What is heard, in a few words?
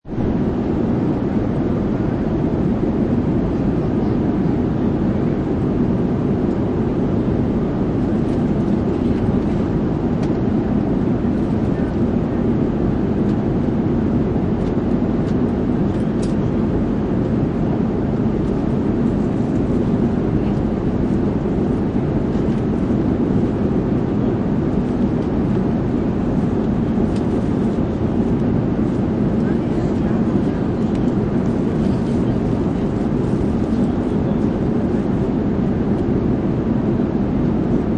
Aircraft Interior; Airports; Lynne Music